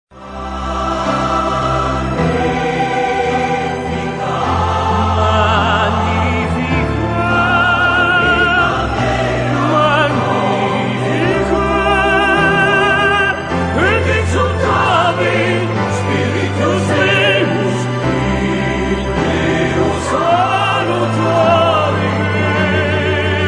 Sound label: Magnificat Tenore